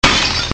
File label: Plate Glass Breaking